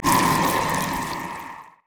vladimir basic attack; LoL

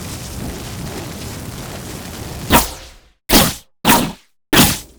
bear fire loop; LoL; annie base

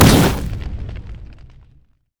landslide; LoL; malphite

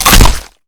trollsmash oh; trundle; LoL

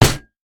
pirate basic attack; LoL; gangplank

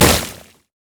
void ooze; LoL; kogmaw

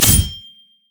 riposte block; LoL; fiora

